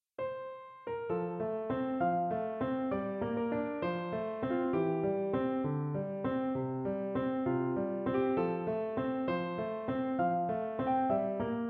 At Peace Piano

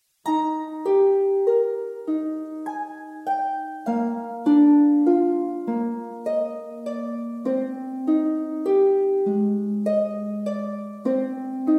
Little Harp Pieces

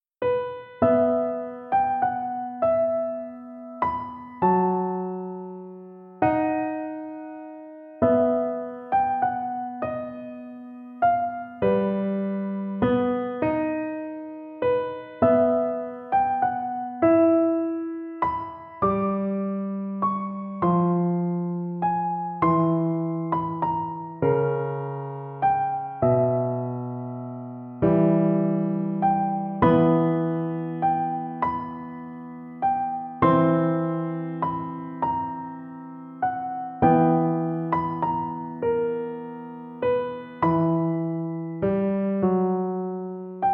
Arrangement for Easy Piano; Harry Potter

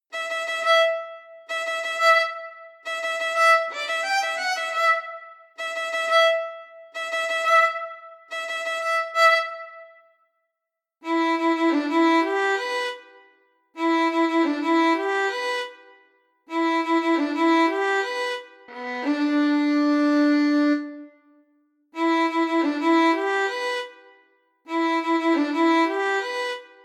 Violin

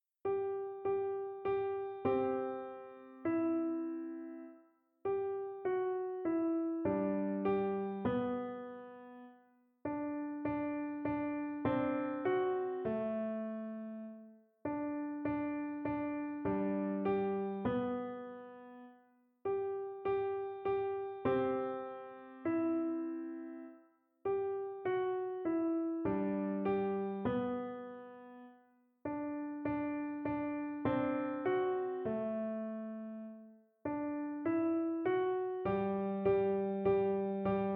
Easy Piano

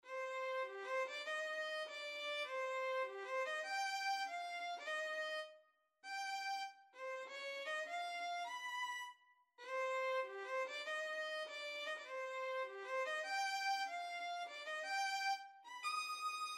Violin; Monkey Island; Ghost Ship Shuffle

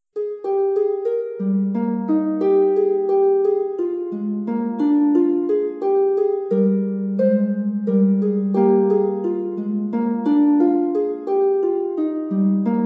Little Harp Pieces

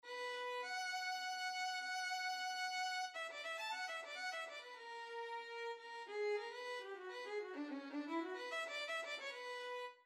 Violin Arrangement; Sita Ramam

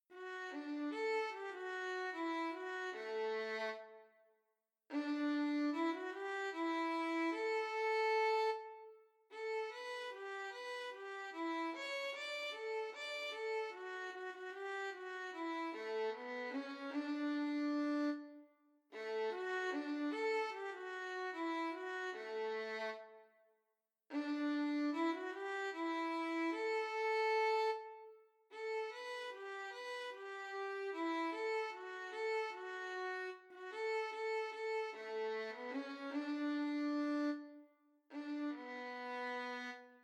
Violin

